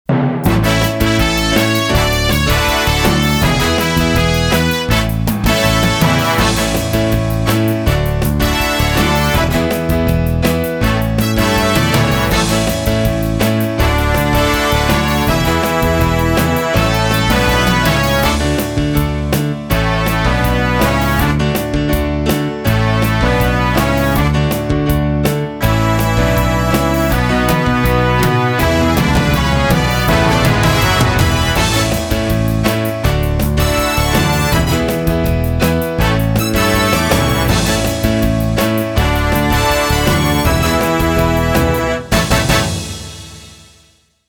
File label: Instrumental; Kids Liturgy; Year A